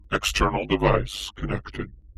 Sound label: Device Connect